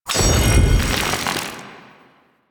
Spear Impact; Warframe

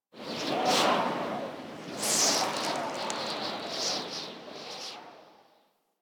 Eidolon Wind Gust Large, Ambience Plains; Warframe

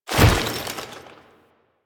Foley Jump; Warframe; Kahl

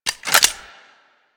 Double Barrel Shotgun Clip Out; Weapons

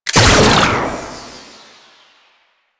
Freeze Ray Shot; Warframe; Weapons; Corpus

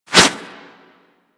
Melee Swipe; Warframe; Kubrow

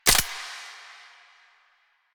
Pistol Dry Fire; Weapons; Warframe; Tenno; Lasrian

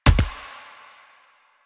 Pistol Punch; Weapons; DE; Warframe; Tenno; Lasrian

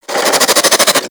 Infested Crawler Attack; Warframe

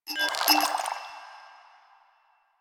Vial Foley A; Warframe; Lasrian; Lasrian Soldiers; Footsteps; Lasrian Goonadier

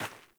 Sandals Run Rock, Footsteps

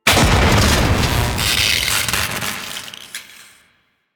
Security Trap Electric Explosion; Grineer Forest; Warframe; Veilbreaker; Grineer Forest Thumper; Kahl

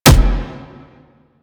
Explosion Punch Generic Medium; Warframe; Weapons

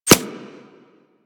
Arrow Impact Default; Warframe; Weapons

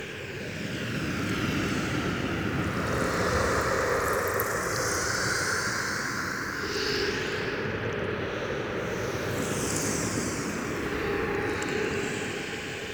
Low Health Soul Plane Amb Loop; Player; Envoy

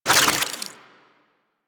Idle Spin, Weapons; Warframe; Parazon

